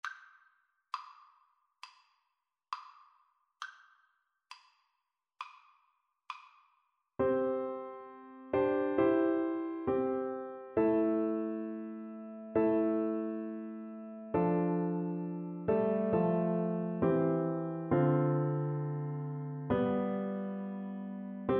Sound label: bleak midwinter, Alto Recorder